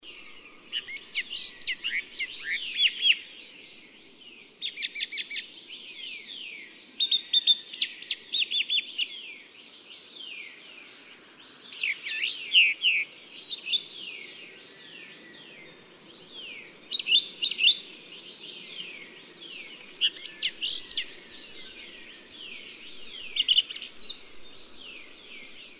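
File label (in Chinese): 白頭鶇, Yushan National Park; 島鶇; 鶇科/留鳥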